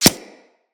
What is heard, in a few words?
Arrow Impact; Weapons; Bullet Impacts